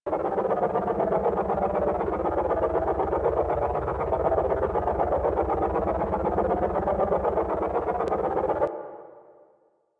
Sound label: Machine Idle; Warframe; Liset; Hub; Machines